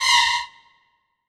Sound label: DD SYN, Disco House